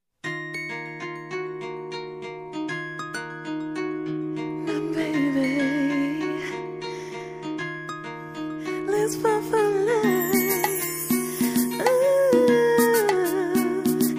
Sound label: hip hop rap